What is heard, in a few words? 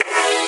E stab; funky; Loopmasters; Funky Underground Vol 2